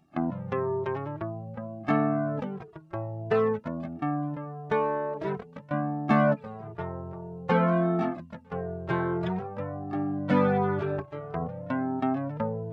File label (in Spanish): Sample Guitarra Flanger